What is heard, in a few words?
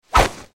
Medium Speed Air Whoosh